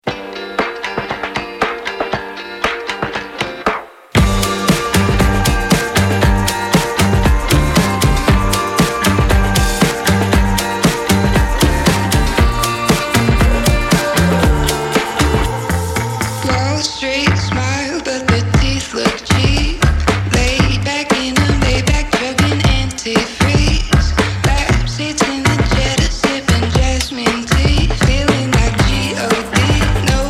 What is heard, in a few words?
Drum Machine